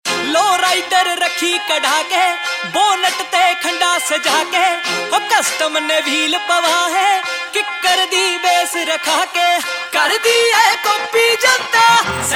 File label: Ringtone, Punjabi